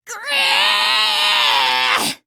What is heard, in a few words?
grehh; monokuma; drv3